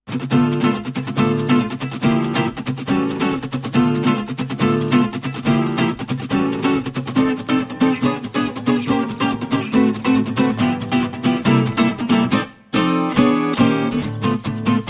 Jazz Club, Rhythm Guitars

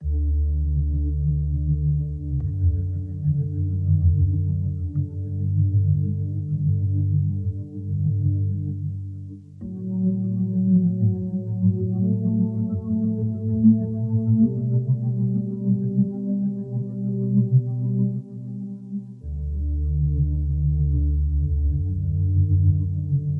Hook

